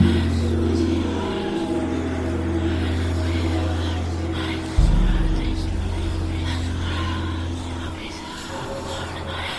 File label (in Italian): ATMOSFERA; svencoop; keenhalloween